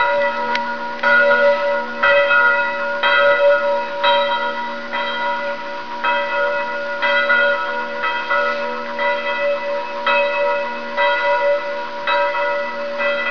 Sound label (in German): Glocken